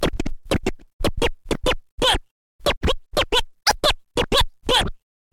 Scratch

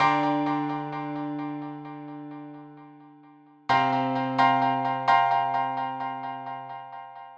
piano 130; freaxonic

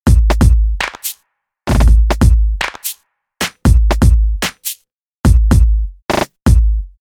downbeat; spoon

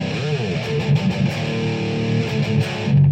metal notification

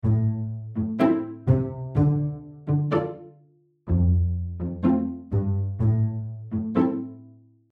simple pizzicato cello; jamievega

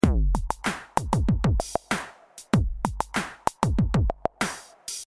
funk

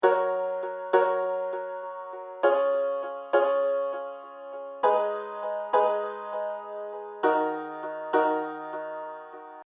summer piano; cufool

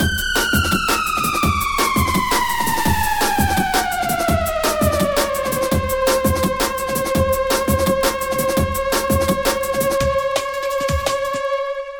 Drum N Bass Scream

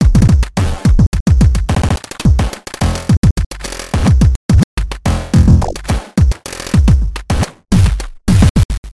Glitch Beats

